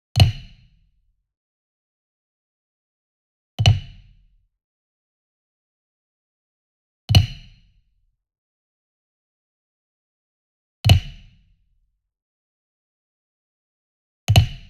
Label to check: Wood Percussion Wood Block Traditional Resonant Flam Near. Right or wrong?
Right